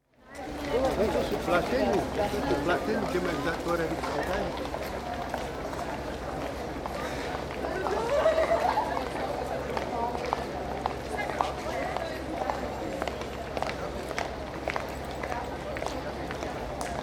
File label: Street, Bratislava, traffic (including heavy trams), footsteps, some distinct speech (Slovak); Crowd; BBC